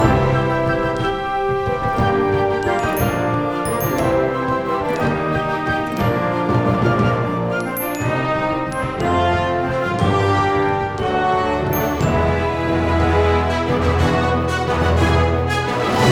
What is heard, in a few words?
Menu Theme Loop; Fort Triumph